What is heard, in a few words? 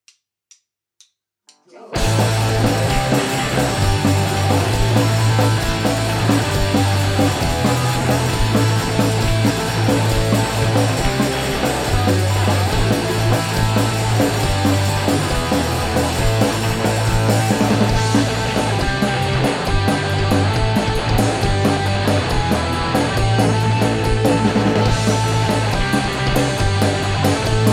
raw no vox